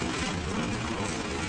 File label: Shell; panzer